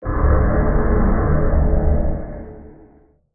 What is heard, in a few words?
gravity magic large; 魔法/法术的音效